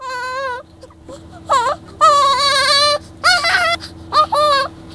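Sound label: baby smile, BABIES; East West; Global Sounds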